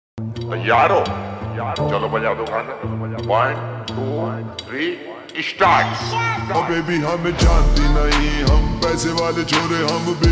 Ringtone Slowed Reverb

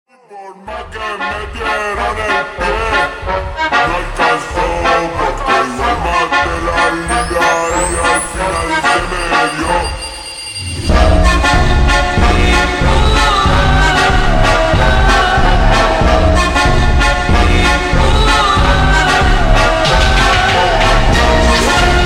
Ringtone Slowed Reverb

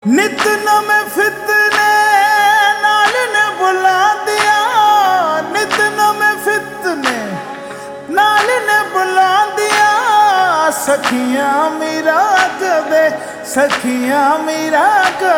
Ringtone Slowed Reverb

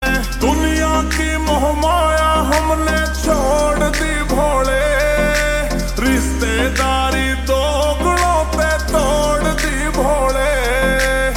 Ringtone Slowed Reverb

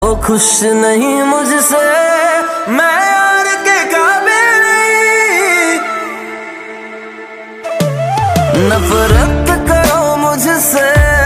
Ringtone

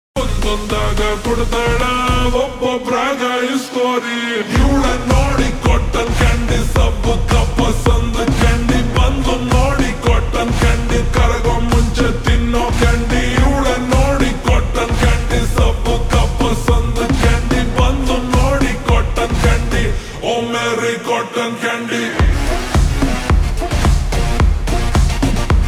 Song Ringtone Slowed Reverb

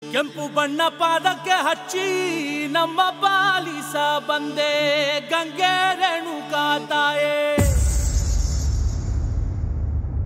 Ringtone; kannada

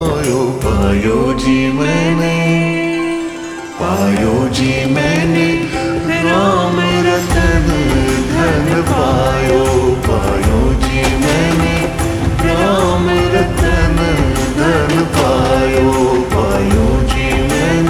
Ringtone Slowed Reverb

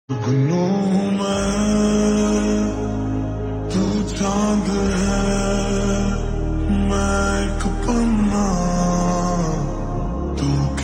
Slowed Reverb

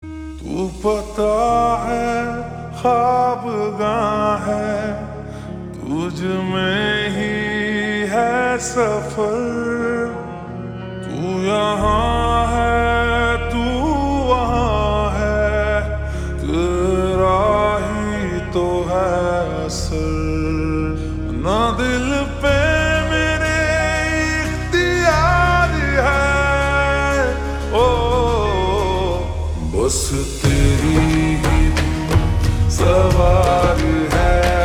Ringtone Slowed Reverb

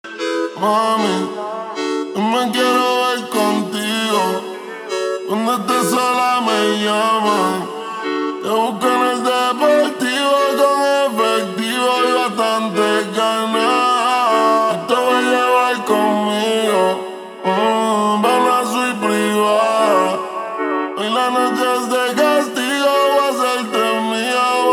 Slowed Reverb

